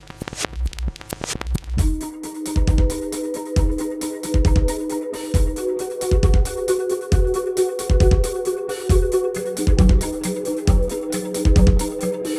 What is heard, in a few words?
electronic intro; Avoid Sensory Overload